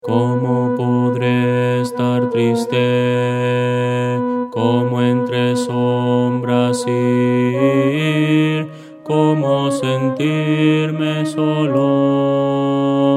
contralto, himnos cristianos; como podre estar triste

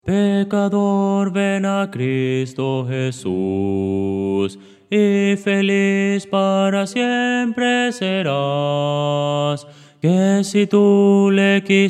bajo, himnos cristianos

